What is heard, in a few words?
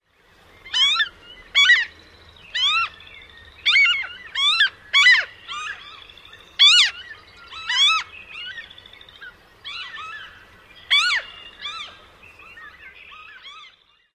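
Franklin's Gull typical calls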